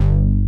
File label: FUNKY LD BASS; Pro-Rec - Synthsonic; BASSES